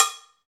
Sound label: COWB, Cow Bells, Roland; Club-50 - Foundations Roland; CowBel+Metl